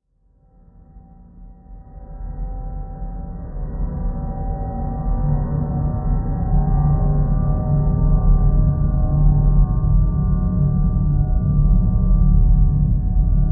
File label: Ominous Rumble Drone With Metallic Resonance